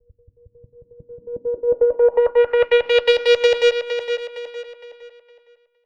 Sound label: Filter Proc, alarm siren, 130bpm; musicradar